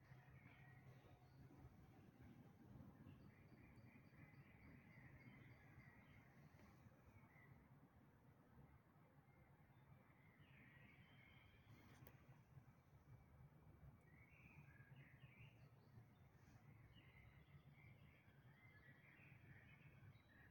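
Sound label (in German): Dawn Chorus, Vogelgesänge vor Sonnenaufgang; Dawn Chorus 2025; Anton-Saefkow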